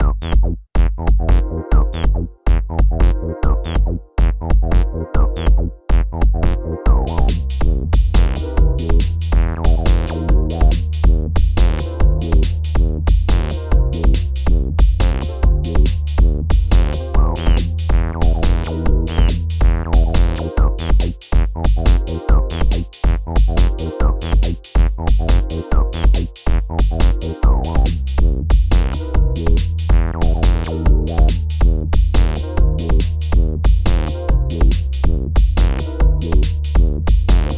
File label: Tascam 688 MIDI Studio